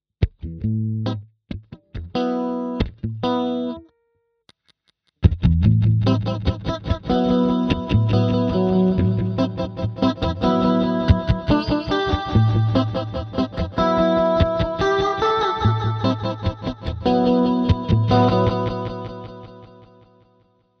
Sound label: LFO; SPACE BENDER x10